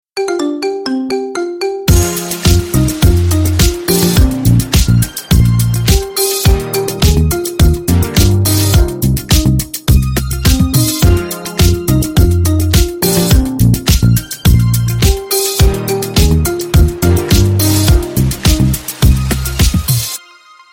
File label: marimba remix